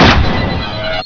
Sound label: WEAPON; CHASM